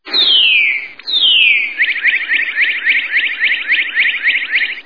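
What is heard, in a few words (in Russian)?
звонкий свист кардинала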